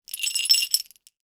jingle bell

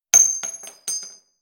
empty bullet shell fall; mechanical

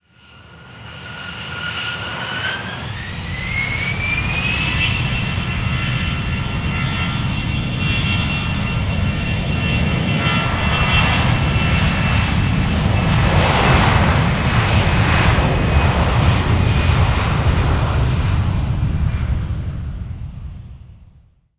airplane take off; transportation

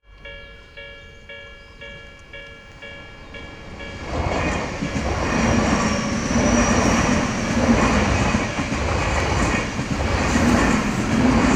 metro train crossing; transportation

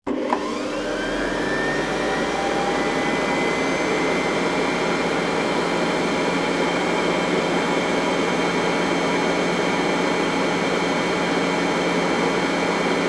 kitchen aid mixer; appliances

